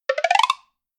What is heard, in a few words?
notification; samsung Aleart